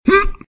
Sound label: hurt; RadwinIsland